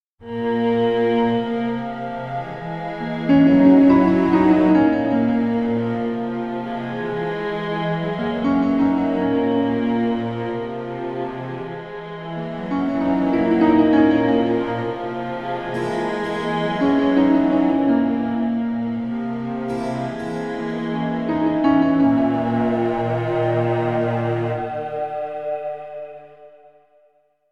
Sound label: Horror Film Theme